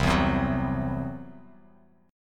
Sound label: C-Major Flat 5th, Bass Standard; strummed